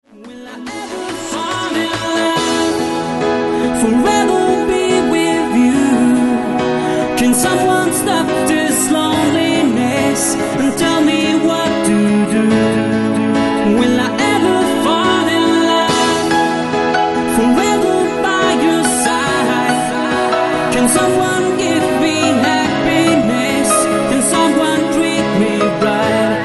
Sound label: Piano